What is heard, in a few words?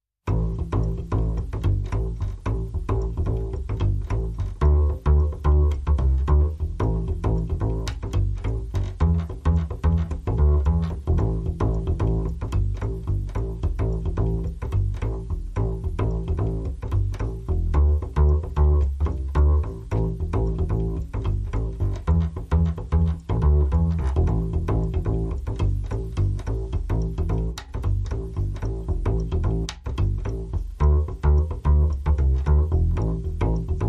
Jive Bass Go Round